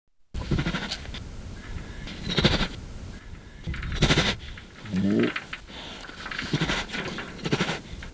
Panthera tigris sad

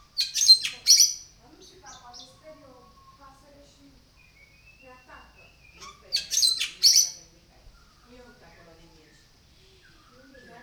Leucopsar rothschildi

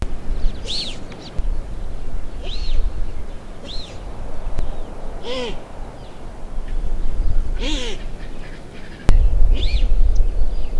Antidorcas marsupialis alarm

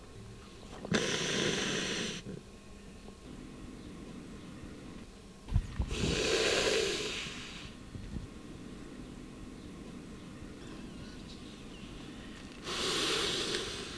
Zaglossus bruijni

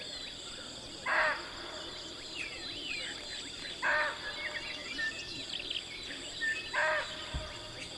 Muntiacus vaginalis alarm

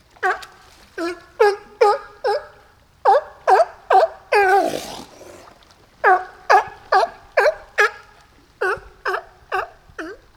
Zalophus californianus

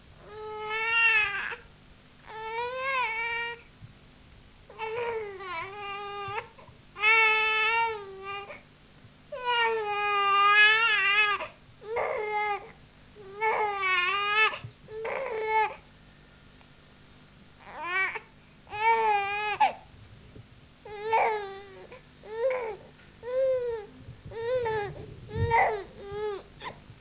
Homo sapiens whine

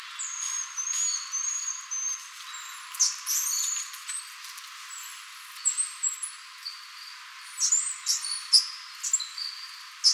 Saguinus midas group

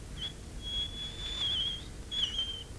Canis familiaris; darial

